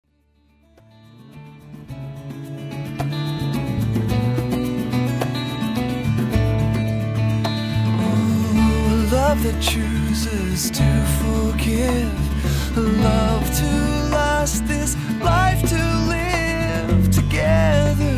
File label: Percussion Track